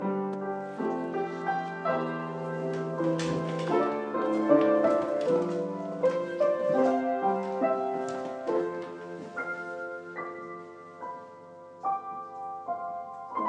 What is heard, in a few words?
piano